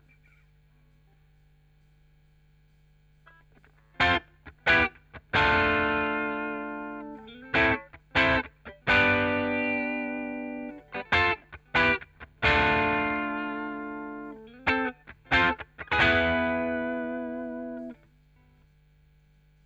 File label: Chorus Gtr